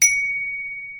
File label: ding